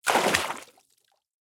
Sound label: nature water pour medium amount deep sudden fast; zapsplat